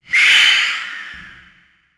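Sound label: eagle voice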